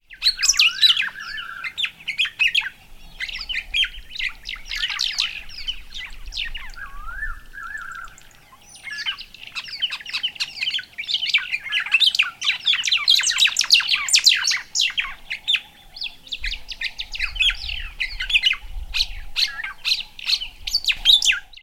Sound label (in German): Vögel; CKGR; Passarge; Ausflug mit Expeditionscharakter